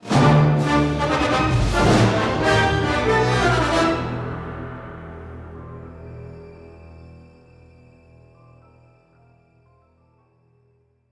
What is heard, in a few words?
Rebel Victory; Hoth